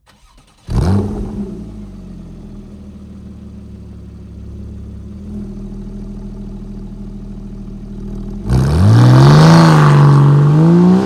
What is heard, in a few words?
GT Exhaust start and full power valve open